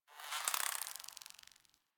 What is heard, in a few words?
Insect Day